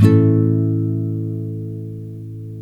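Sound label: NYLON, A MAJOR DOWN, CHORDS; Spectrasonics; Hans Zimmer Guitars VOL-1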